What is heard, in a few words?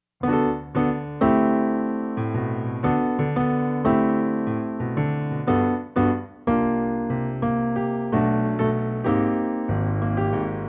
progression G major